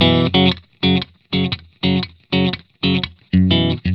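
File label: 120, Vintage Blues Guitar rhythm; USB Soundscan vol.22; AKAI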